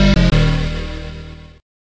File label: Lose; Loopz